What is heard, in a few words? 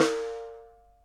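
snare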